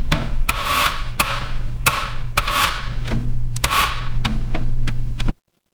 mechanical machine

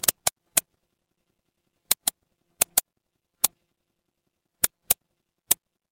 office mouse click

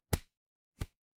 paper fabric cloth whip

